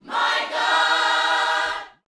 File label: Vocal Gospel PHRASE; Spectrasonics; Vocal Planet Vol.1 Gospel